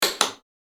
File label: Light switch cord pull